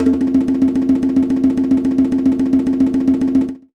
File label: Conga Roll; Roland BK-5; Percussion